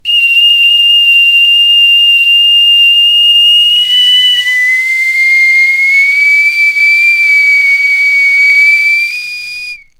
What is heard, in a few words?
fantasy whistling